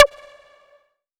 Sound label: Synth Cuts; Instruments; VES1 Synth Cuts